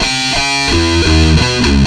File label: Riff, Industrial; Nasty